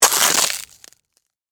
Impact Ice Sheet, Crack; Cracking; Objects; BlastWave

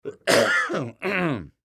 Human Cough; Cough Clear Throat Male Old; Sick; Sound Effects:Human

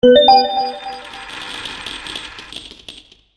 Bonus Gold Coins, video game

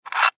Mini Dv Tape Pick Up; Tape Player; Technology; Electronics; Blastwave

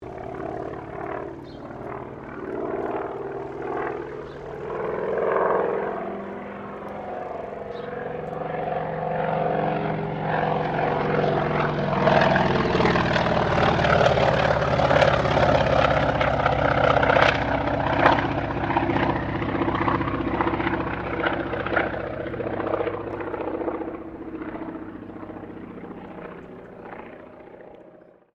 AIRCRAFT CAC WIRRAWAY PASS

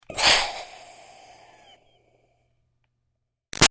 Steam Release Iron Chuff Hard; Miscellaneous Household; Big Room Sound

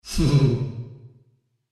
Deep Nasal Laugh With Reverb; Laughter Sound Effects

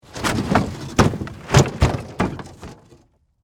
Impact Wood Object, Fall, Over, Heavy; Impact, Wood, Object, Fall, Roll, Over, Heavy; Wood Sound Effects

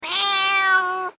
Animal Cat Tabby Meow

